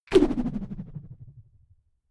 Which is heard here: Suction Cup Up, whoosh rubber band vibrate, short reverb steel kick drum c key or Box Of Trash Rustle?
whoosh rubber band vibrate